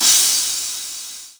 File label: Crash, Drum Hits; Zero-G; Soundclash